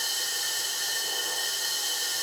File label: Pipe Steam Loop; Warframe; Caliber Chicks; minigame